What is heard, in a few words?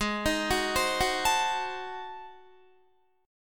Ab 7th Flat 9th Guitar, chord; Ab7b9 arpeggiated; JGuitar